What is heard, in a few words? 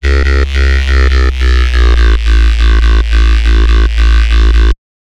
Bassline, Dubstep and Trap